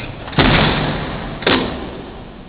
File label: Close door; Wolfenstein 3D